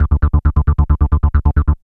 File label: e pulse b4; DirectX SDK; DMusProd